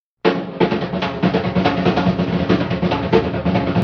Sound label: Drums; Drums Monsters